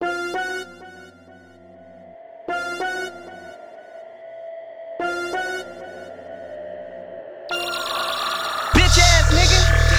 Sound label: Melody, Hip-Hop; Sonic Foundry (Sony Creative Software); Lukecage Underground Soundlab Hip-Hop